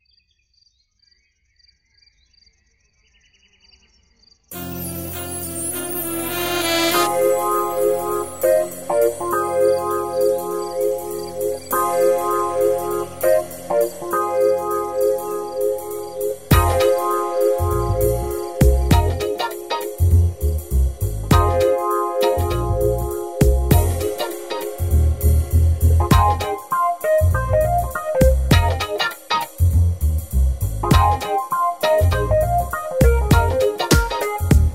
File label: Swing Love Birds